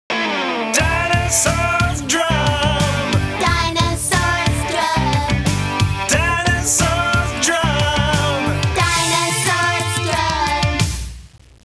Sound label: Song Letters d D S O N G; School; Fisher Price Ready for School Kindergarten